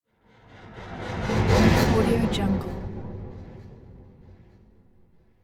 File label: metal spin space